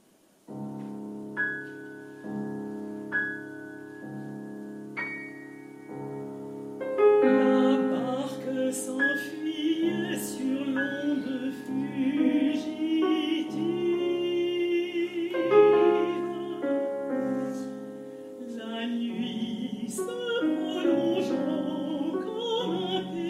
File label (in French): pour mezzo soprano piano; carriere jean paul cloche soir revision 2022